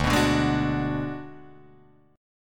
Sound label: D 6th Flat 5th D down Guitar Drop D, chord